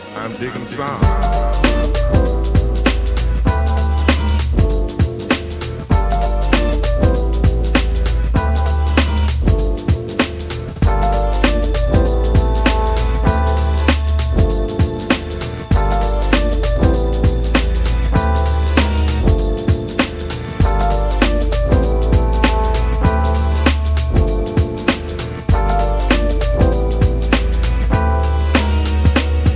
jazz

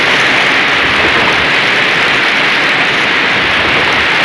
waterfall; ProjectMobius; ambient; enviroment

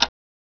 clock

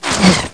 whizz, weapons

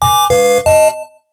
sign on beep

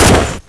turret fire

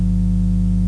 hum

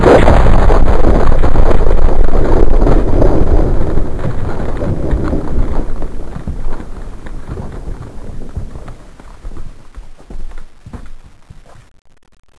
explode

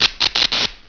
spark; mgs

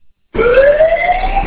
alarm

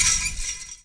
fence

